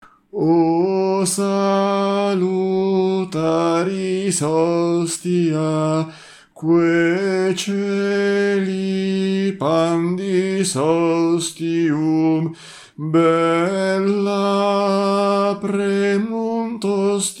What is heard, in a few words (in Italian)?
soprani; O Salutaris Hostia Perosi